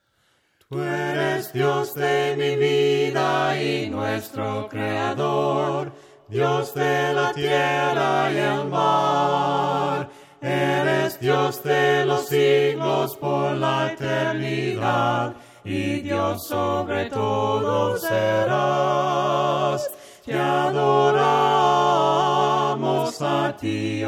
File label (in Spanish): VOCES, himnos a capella; cantos del camino; DIOS DE MI VIDA